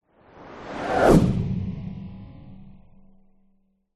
science fiction whoosh element pass by machine vehicle ultra fast